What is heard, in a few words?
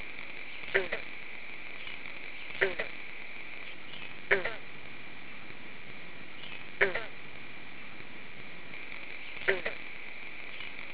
Green frog; frogs